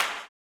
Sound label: CLAP, blues & soul; ILIO; Vocal Planet